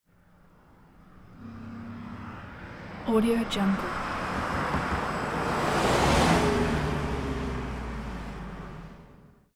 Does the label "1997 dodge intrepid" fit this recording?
Yes